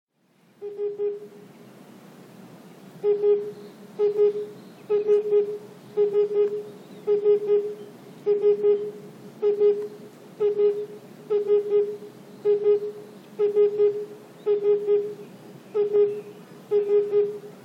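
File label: oriental cuckoo, okutama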